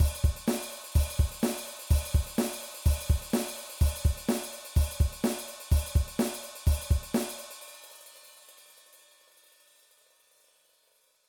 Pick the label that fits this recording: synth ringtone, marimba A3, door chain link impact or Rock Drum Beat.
Rock Drum Beat